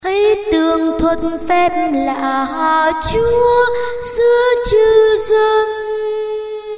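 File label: soprano alto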